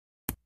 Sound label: Golf Club Hit Trap